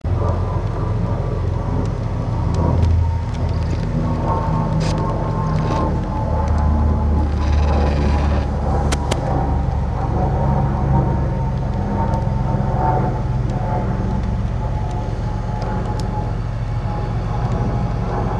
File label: Truck in Rain; Richland WA